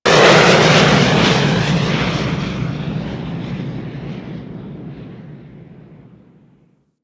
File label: Launch Fly, Rocket; Warframe; machines; Jackal